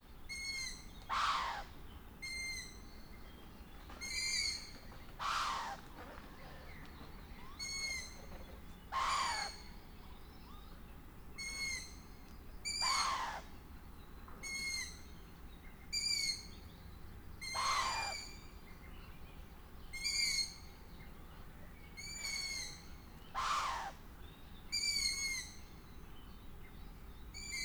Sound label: Long eared Owl Food delivery with various calls of male female juveniles